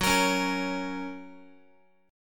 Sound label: Gb Major Gb down Guitar; Listen to Gb strummed